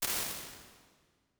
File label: Vocal Plate, Lexicon 480L Reverb; impulse responses; Big Gee's